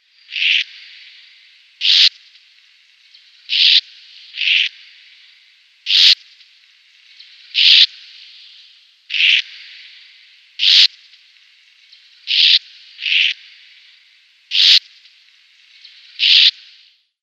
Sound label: Plain Martin calls